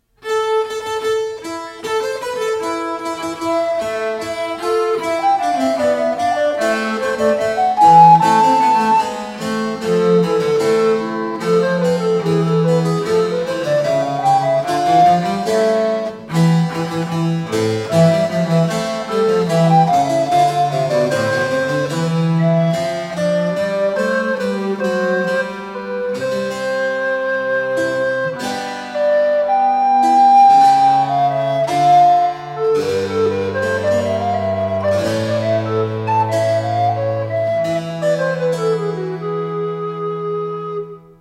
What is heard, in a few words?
Dream Tenor; Frescobaldi